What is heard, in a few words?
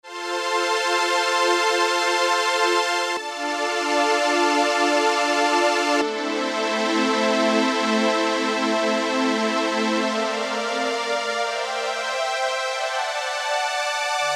String Machine, Roland JP-8000; Factory Patches